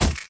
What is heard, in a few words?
yellow ball appear